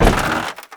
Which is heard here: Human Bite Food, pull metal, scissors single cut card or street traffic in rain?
pull metal